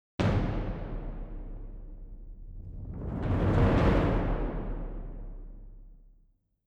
bass drum; Netease; gank

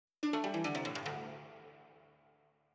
guzheng; Netease; kill boss tortoise

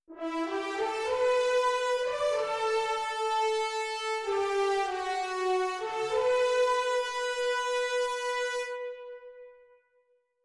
horns; Netease

